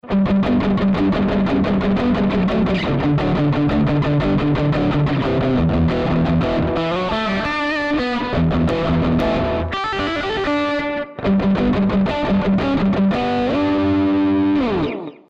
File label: fat crunch riff clean; amplitube